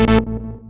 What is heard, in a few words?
Select invalid; Touhou Jitsuzaisou Dream Logical World